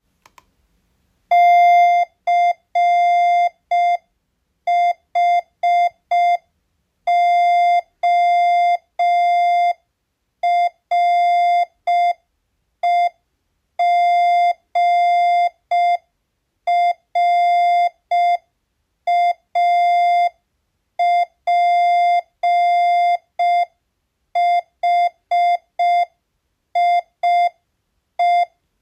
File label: morse